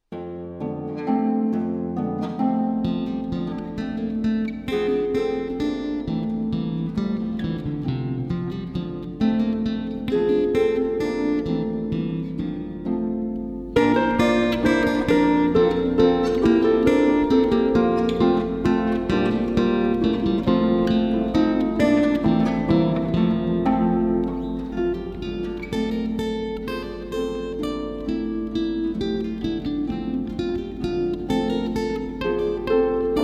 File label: Zither Carol